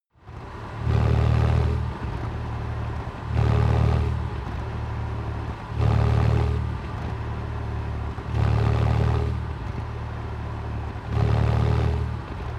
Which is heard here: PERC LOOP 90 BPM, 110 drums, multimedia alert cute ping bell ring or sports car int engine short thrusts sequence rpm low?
sports car int engine short thrusts sequence rpm low